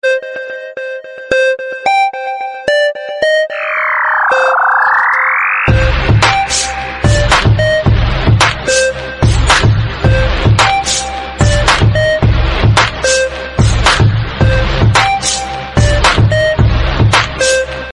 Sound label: cute call notification sound effects